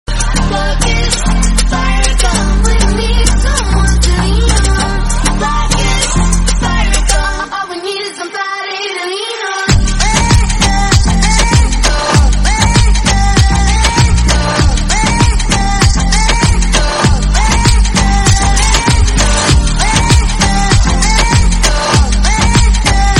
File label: english dance song ringtone